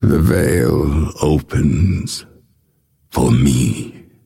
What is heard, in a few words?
death; dota; phantom assassin